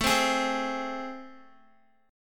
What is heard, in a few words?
A Minor Major 7th, down, Guitar; AmM7; strummed